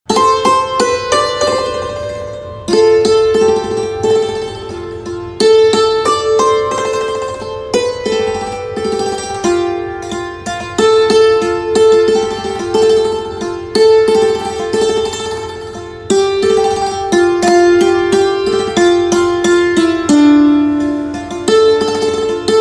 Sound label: Santoor Instrumental Ringtone